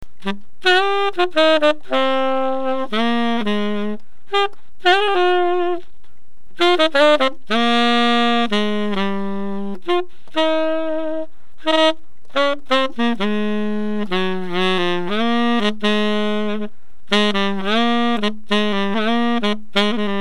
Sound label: bossa